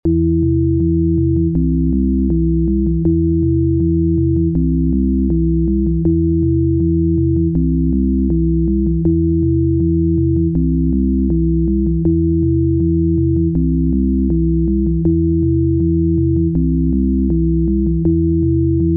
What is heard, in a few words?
Sine Waves; 64 Barnumbers